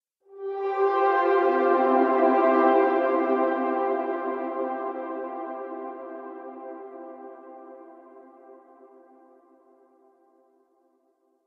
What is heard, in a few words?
Cathedral Fanfare; Musical